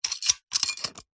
office stapler mechnanism metal movement clicks short